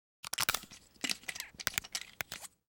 Remote Antenna Off; yesterday origins gamerip 2016 switch ps4 pc ios macos

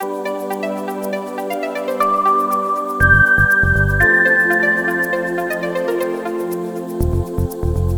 Music Calm; Learn to Fly 3

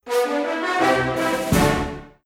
Card Legendary Stinger; Zak Storm Super Pirate; mobile

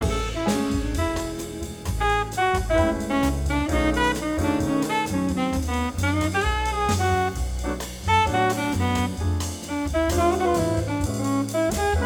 Bossa Nova

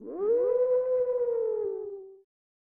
Battle Intro; Dark Half; SNES

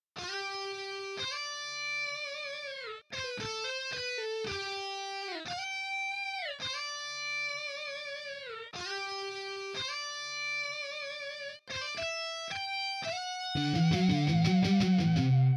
melody; game builder garage 2021 switch

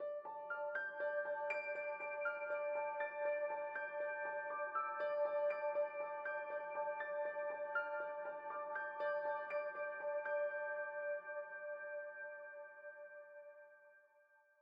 Piano

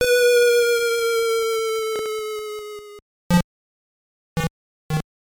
Trap Floor; Anticipation; NES

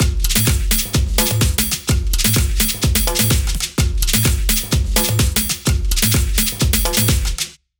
Jingle Halftime; clubhouse games 51 worldwide classics 2020 switch gamerip

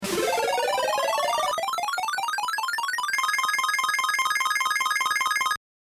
Level Win; Gex Deep Pocket Gecko; gbc

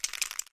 Shake Spraycan; Lethal Company

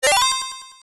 Coin; Twin Squash; System C-2; arcade

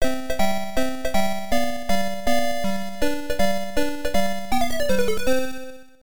Bonus Clear!; Calorie Kun vs. Moguranian; arcade gamerip 1986

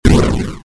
Enemy big bomb

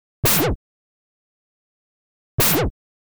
Sound of Shots Hitting Star shaped Enemies; oretachi game center zoku terra cresta 2005

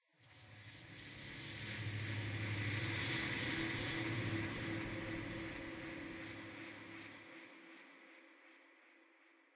Npc Combat Noise

